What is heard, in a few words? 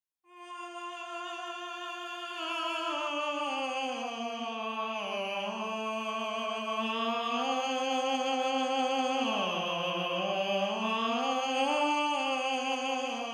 Tenor, counterpoint